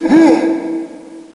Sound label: Voices - PAIN; Tchernobog; Blood: Fresh Supply